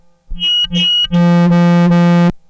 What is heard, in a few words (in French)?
canard